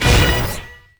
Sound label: sk8 chalk challenge start; Tony Hawk's Project 8; XBOX